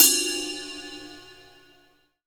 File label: FX MIX RID, DRUM; AKAI S6000 CD-ROM - Volume 1; DRUM RYTHM